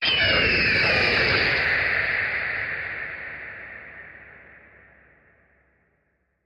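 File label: creature; Riders Flight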